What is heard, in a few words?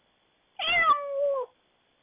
cat meow